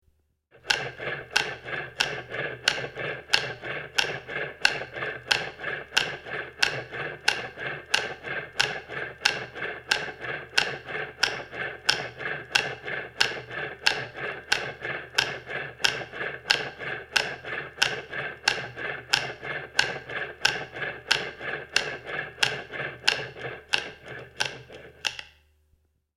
Heart Simulator; On, Run Steady In / Out Breathing With Light Bellow Inflate / Deflate; heartbeats